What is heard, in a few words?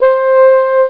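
FRHORN C4, French horn; Alone In The Dark Demo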